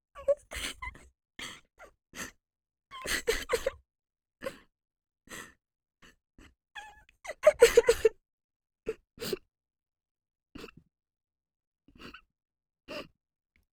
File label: Sad Loop